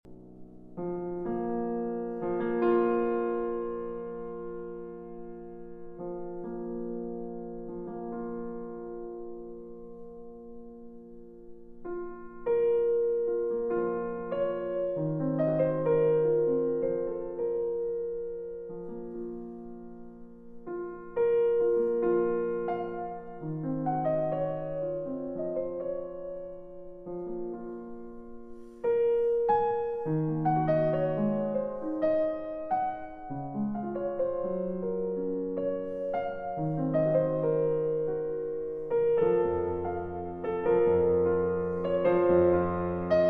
Piano Solo, Wiegenlied; Two Pieces For Piano Solo II.

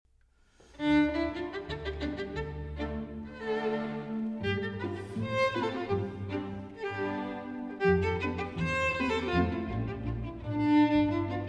Romanian Folk Dances; BB 68, Sz. 56; Bartók; No. 2, Brâu

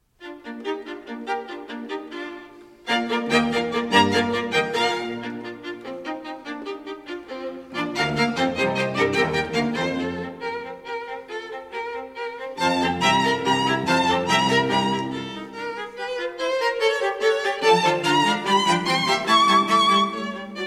String Quartet in B Flat Major; Brahms

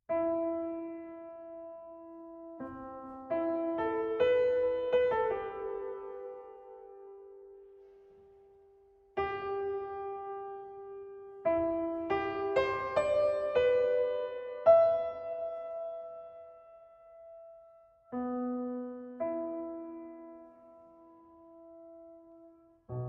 for Cello and Piano; Peer Gynt, Op. 23; Act 3; Solveig's Song; Arr. for Cello and Piano by Georg Goltermann

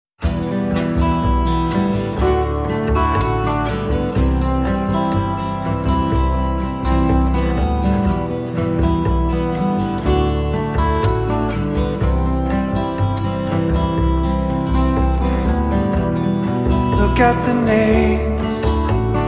Acoustic

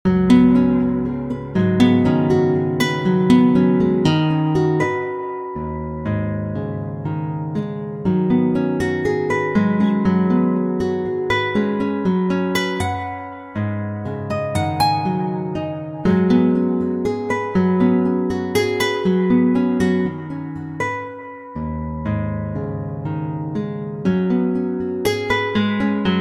Heartwarming Guitar Song

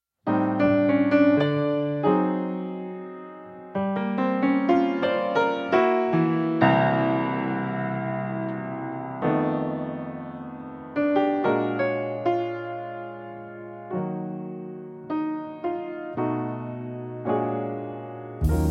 Piano Jazz Trio, Trumpet

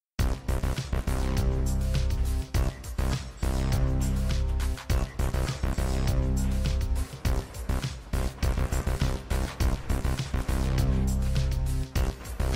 Epic Synthwave Music

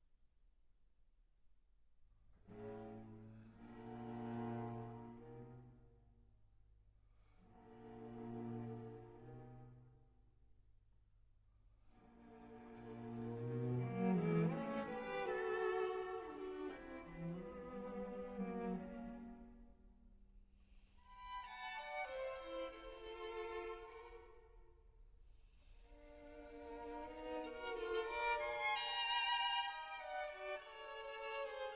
String Quartet in A Minor Allegretto

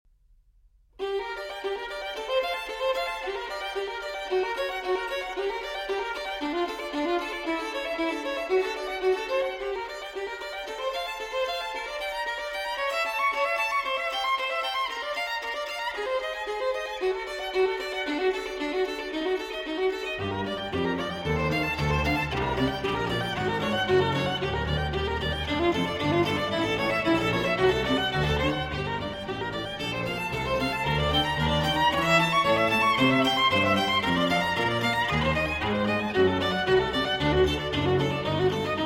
Sonata for Violin and Piano, For Ensemble; Movement III; Arr. For Ensemble By Angèle Dubeau and François Vallièr

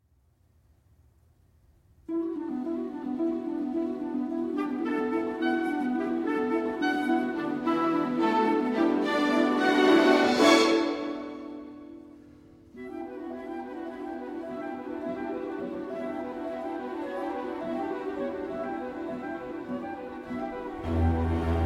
Cello Concerto, Theme & Cadenza

